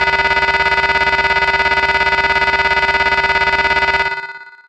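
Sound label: Alarm Call DX100 G#2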